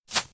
Ranger Scatter Shot Arrow

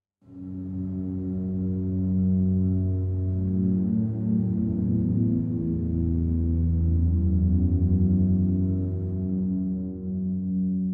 Organ Low; gamerip; that dragon cancer

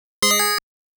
COIN; Sega Motorcycle Music History

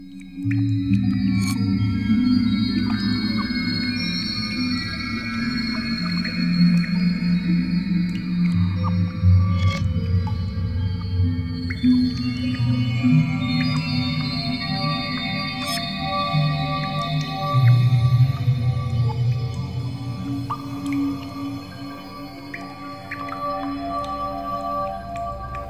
(Ambient) The Lagoon; rayman arena

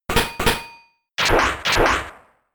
Metal Claw; attack moves; Pokemon; Gen 4; DPPL HG SS

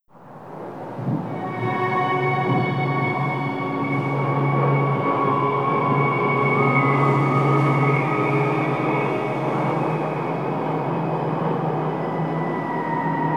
Tower Ambience; Monster Hunter 2 Dos complete soundtrack; Area 10

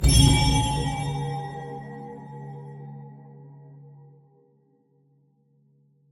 Rune; The Dwarves 2016 ps4 gamerip

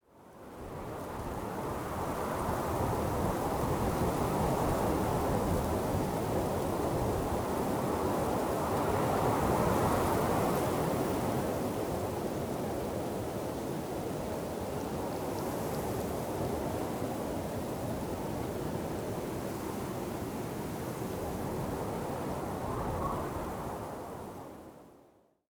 Wind; Oriental Empires

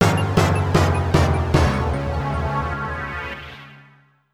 Jingle Game Over; StreetPass Mii Plaza; StreetPass Squad

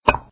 Minicopter Touch

